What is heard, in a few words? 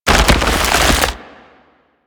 Spawn Pod Grow; Warframe; Infested